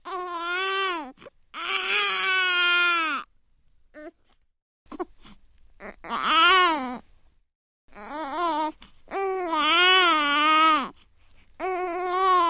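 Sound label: sounds of newborn crying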